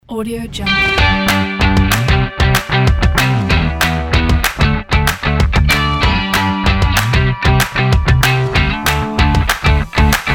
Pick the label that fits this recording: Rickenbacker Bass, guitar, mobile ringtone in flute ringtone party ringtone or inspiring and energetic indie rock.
inspiring and energetic indie rock